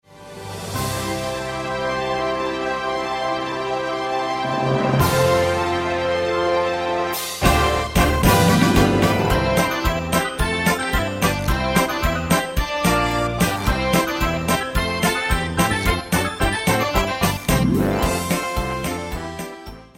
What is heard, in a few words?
overture